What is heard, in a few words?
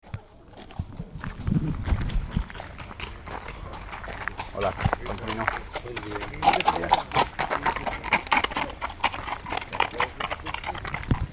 Horse at Irache